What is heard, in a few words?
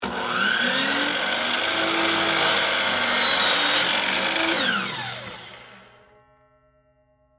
Miter Saw Cut Wood, Industry, Miter, Saw, Cut, 2X4, Wood, On, Run, Off; Saws; BlastWave